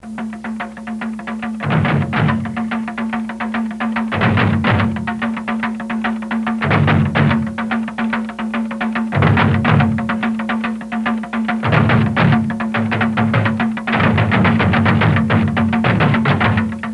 instrument wardrums

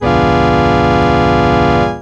instrumental organ chord

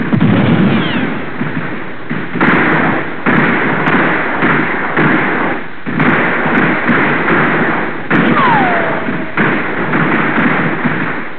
gunbattle with ricochets

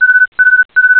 tone beeps three

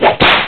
whip crack

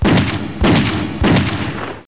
gunshots three

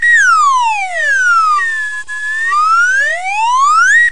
whistle slide down up

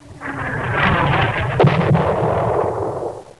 explosion missile incoming with explosion

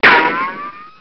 gun ricochet

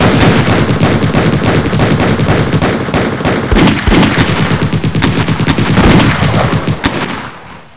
gunbattle includes machinegun

